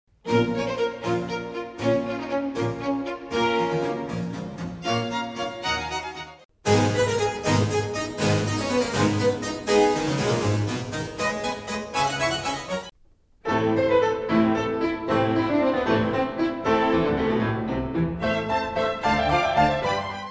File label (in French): 4 violons 4 clavecins 4 pianos